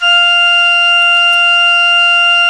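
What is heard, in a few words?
Tone F4, church organ; Best Service; Advanced Media Trax Modern Composer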